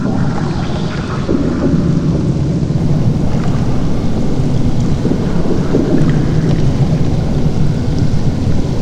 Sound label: cryo bubble machine; Quake 4 Alpha; ambience cryo